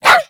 Puppy Idle Bark; Vocals; Warframe; Kubrow

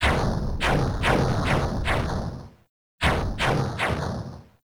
Background Explosions; Warframe; Caliber Chicks; minigame

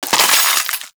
Loot Container Break; Sentient; Warframe; Sentient Devourer; Ambience

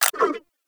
Briefcase Akimbo Pistol Mech; Warframe; Corpus

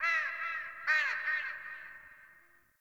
Clan Dojo Misc Crow; Dagath; Warframe; Ambience

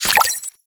Sticker Equip; Gameplay; Peely Pix; Warframe; Nineteeen Ninety Nine; Deep Archimedia

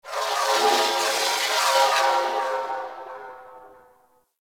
Ship Metallic Data; Warframe; Corpus Ship Remaster

